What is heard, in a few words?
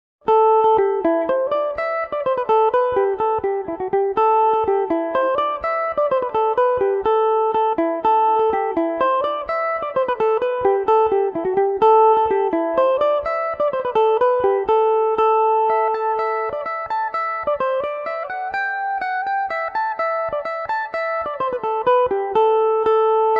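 polka A dorian, irish mandolin; joe banes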